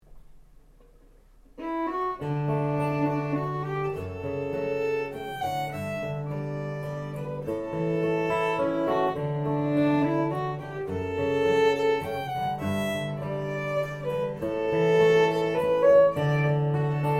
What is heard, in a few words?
Mr Mrs MacLean Waltz